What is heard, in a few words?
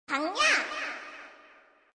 Shot; Pangya